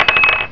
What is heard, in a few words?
METAL BU